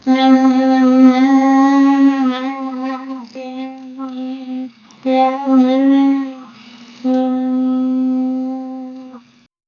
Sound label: liked sound; interaction